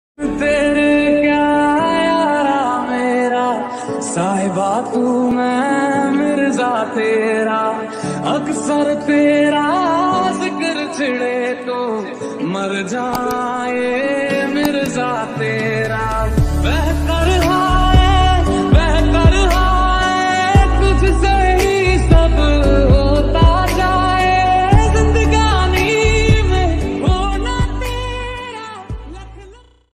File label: ringtone lofi ringtone; jersey